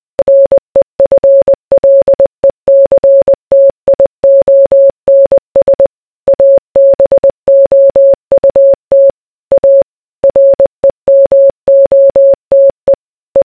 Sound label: interval signal